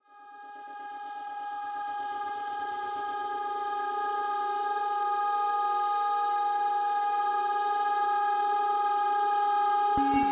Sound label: Delta Binaural 432 Hz; Dormir Profundamente